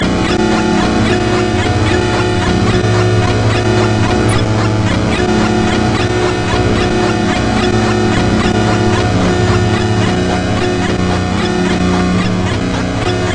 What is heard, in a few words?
melodic drone